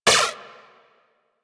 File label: Shot, Weapons; Warframe; Moa Pet; Tazicor